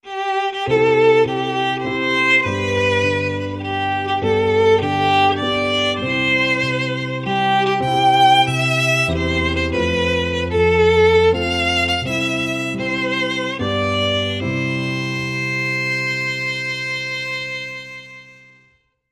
Viola and Piano Happy Birthday

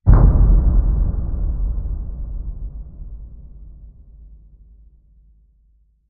Hit Low Backfire

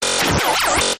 Interrupted Transmission Burst

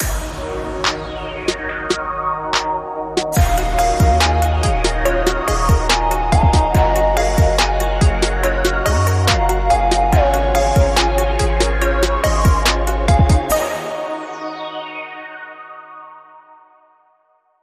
Funky

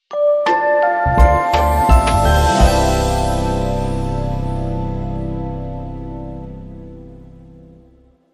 Short Sting No Crackle

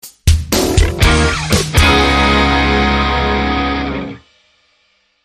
Two Notes Organ Driven

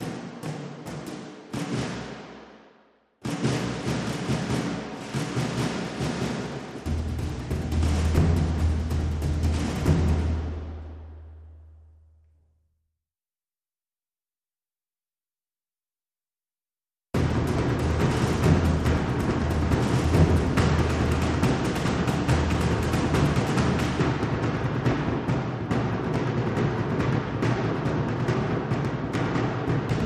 Loop Layer Dhol Ensemble

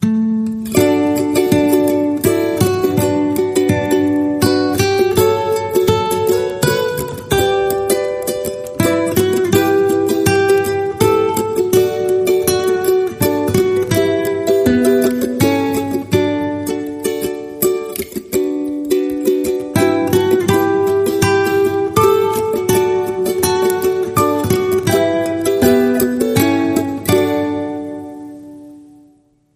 Ukulele Guitar No Bells

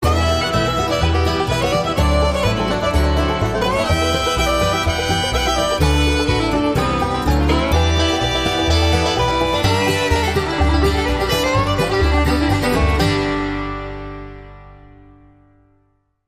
Bluegrass

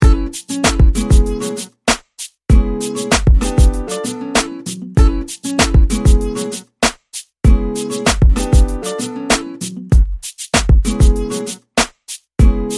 Modern Guitar

